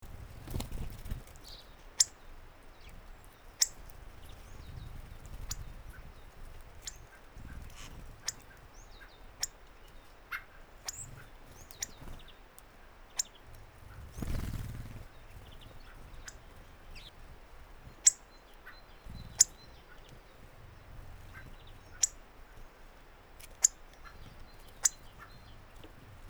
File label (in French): grosbec casse noyaux